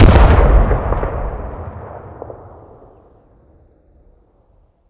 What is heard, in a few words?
Explosions Explode Distant; DCS